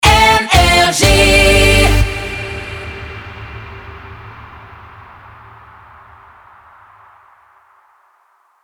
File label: Jingle Rapid Fire; NRJ